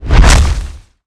Weapons Swing

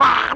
hit lizard

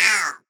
Die

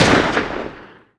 GUN

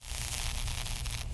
exp light

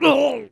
DMG

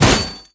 armor

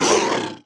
DMG PIG

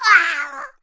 ball red

